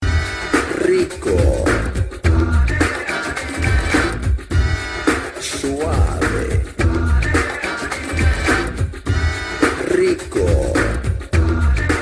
Pop

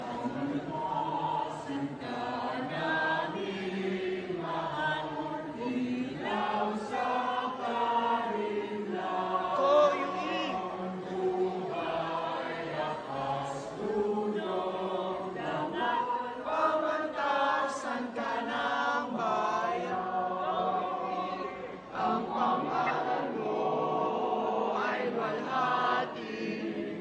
hymn

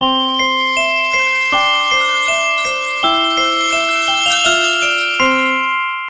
christmas tone

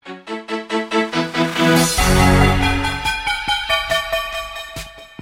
club energy

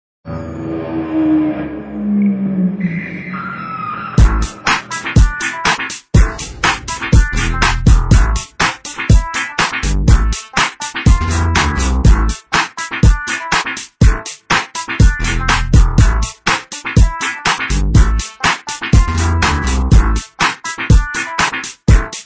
RINGTONE RNB

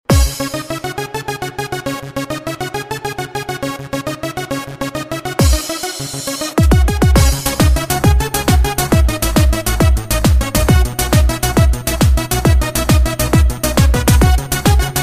trance, ringtones

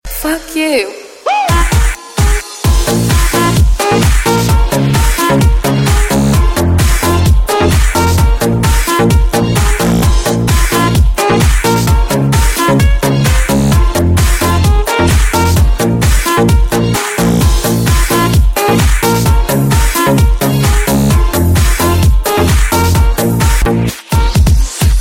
harlem tango

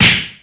slap sms; ringtones

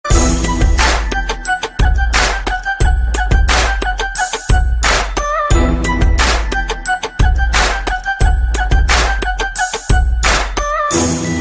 Trap

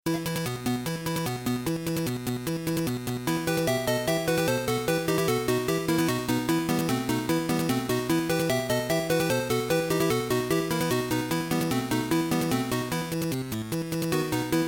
8bit; Tetris

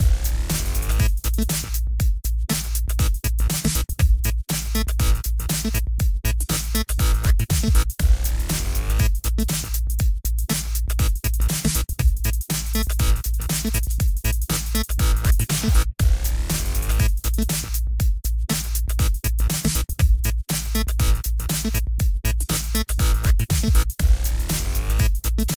Techno

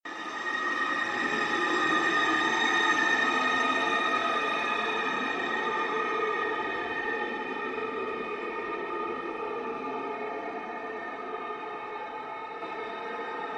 Martian Wind

